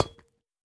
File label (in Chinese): 铜踩踏声